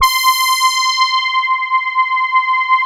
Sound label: Poly800 C5, Korg; Back In Time Records; Korg Universe VOL-1